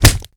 impact flesh; Modern Warfare

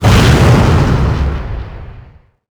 polycraft burst

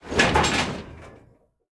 gears rattle

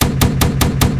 automatic gun 2A42 low, weapons; DCS

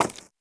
horse footstep concrete hard; Rust

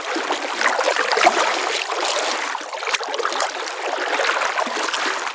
river; World of Tanks Blitz

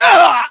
pain

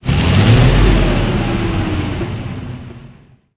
maus engine load, engines tanks; War Thunder

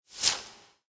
unit entrench move notify

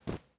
ui drop cloth; Rust

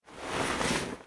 Inf Weapons Foley; Red Orchestra Ostfront 41-45

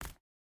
footstep barefoot concrete jump land; Rust

